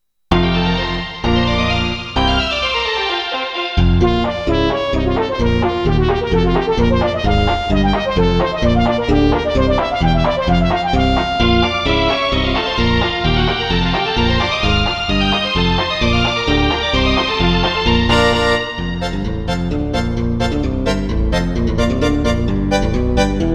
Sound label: polka